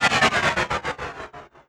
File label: slowdown; Politburo